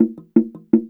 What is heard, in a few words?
SLIT DR PH, SLIT DRUM; Spectrasonics; Heart of Africa 2